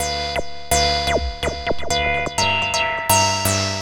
Lead

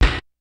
kicks snare, kid; Kid Nepro; Elite; KICKS SNARES

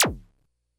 Bleep; Korg DS-10

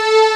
Fanfare; Final Fantasy V

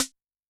Snare rim hit; Yamaha DTXpress-II

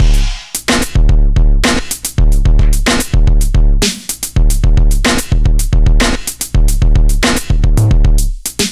beat boy 110bpm

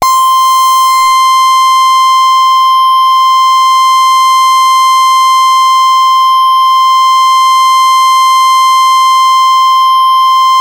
C5, Korg MS-2000; Synthworx

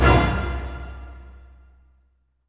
HIT, Orchestral; Orchestral Loops; 128 STRAVNSKY

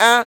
FEM PERC, Vocal Jazz FX; Spectrasonics Vocal Planet

